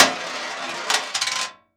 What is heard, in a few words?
Coin On Metal Tray; Coins